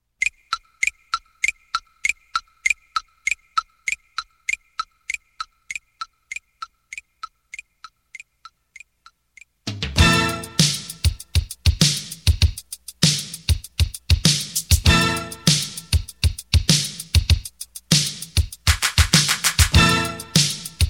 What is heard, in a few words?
Instrumental